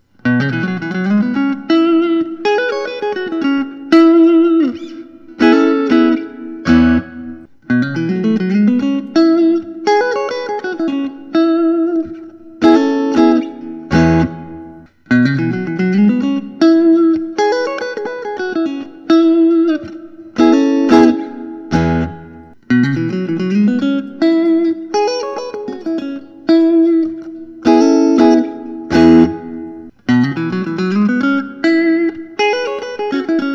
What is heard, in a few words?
detemple spirit series 56 br